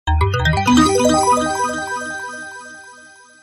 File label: suddenly 3d sms